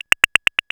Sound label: Uniscanner, Retro Games Sound FX